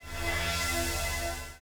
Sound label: Swipes